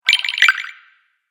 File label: Fantasy Game Collect Sound, video game